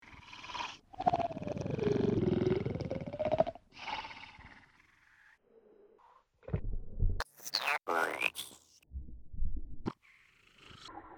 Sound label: noise